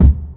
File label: classic hip hop kick; Classic Hip Hop Mega Kit